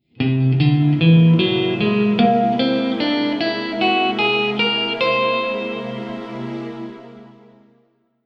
Escala de Do Tons Inteiros, guitar

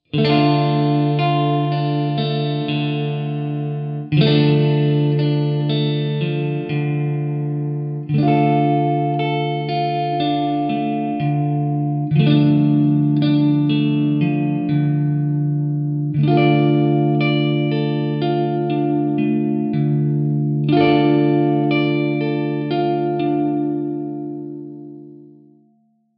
Montagem de Acordes Acordes de Triade Menor

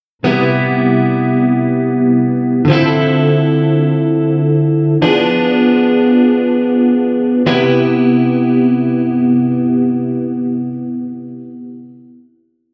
Cadencia C7M Dm79 Fo C7M

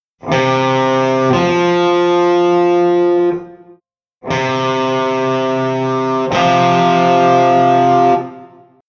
Intervalo de Quarta Justa

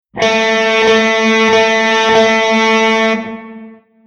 Sustain EXCESSO, guitar

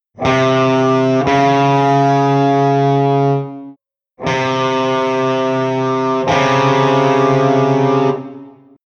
Intervalo de Segunda Menor

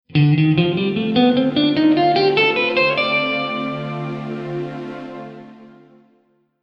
Escala de D Dorico b9

